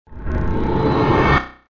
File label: Soulstone Expire; wow; Forte Core